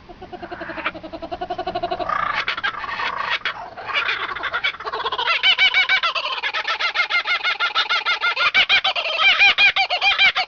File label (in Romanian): Pasare Kookaburra sunet